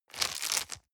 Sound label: plastic bag small turn hard